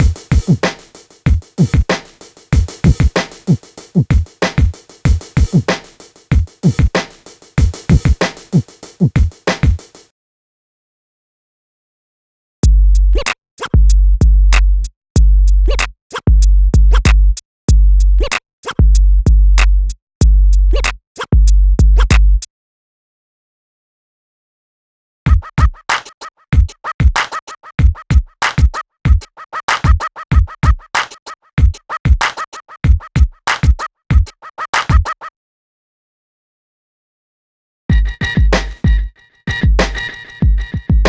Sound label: HipHop Loops 95 BPM; Ударные (Drum Loops)